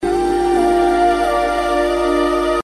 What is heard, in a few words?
melody